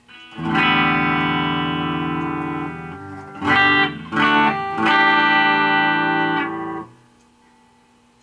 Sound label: gibson before